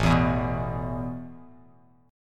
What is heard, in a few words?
A Suspended 2nd A Bass Standard; strummed; JGuitar